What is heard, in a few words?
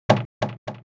drop top, repeated impact large rubber flat squaremat; AnalogousNonSpeech